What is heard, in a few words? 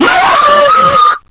demon pain